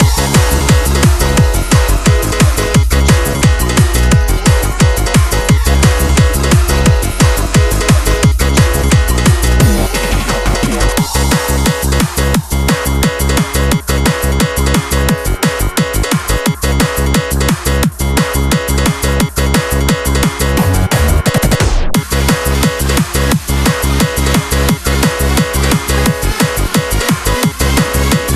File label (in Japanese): 宝石ステージBGM loop; Apploval; Lethal weapon